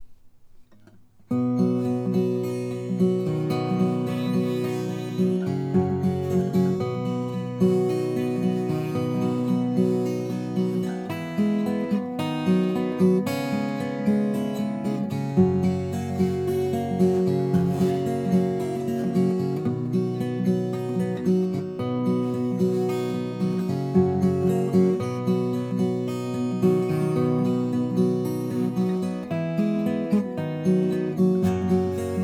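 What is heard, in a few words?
pick 12 right, hsguitar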